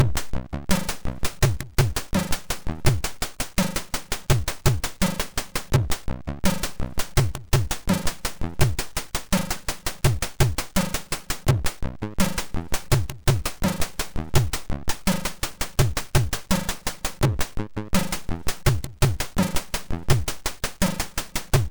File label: Happy Birthday sid MOS6581R2